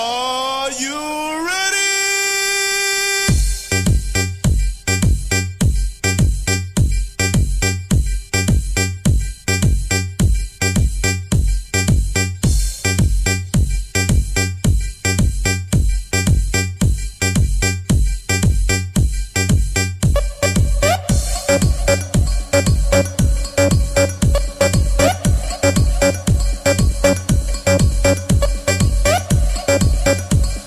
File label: Slow instrumental Music; Are you ready; Ringtone